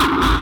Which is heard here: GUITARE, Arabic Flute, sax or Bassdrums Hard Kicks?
Bassdrums Hard Kicks